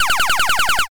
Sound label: rewind